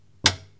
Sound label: Button